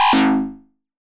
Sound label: tech Fail